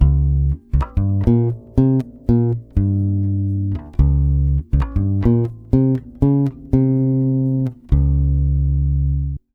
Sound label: 120 C7.9, Brazil Bossa; USB Soundscan; AKAI